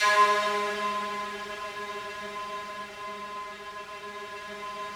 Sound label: FOGATK3 G#2, Yamaha VP-1; Music Store; 90's Sample CDs; FOGATTACK 3